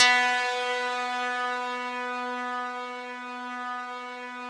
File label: RESMET2 B3, Yamaha VP-1, RESO METAL 2; Music Store; 90's Sample CDs